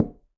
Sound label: Tumba A Tip; Latin Percussion; Drums; Drum Hits; Conga; Ableton; Factory Packs